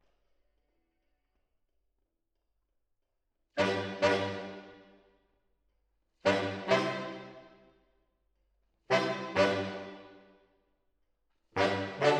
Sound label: MKH AB, piano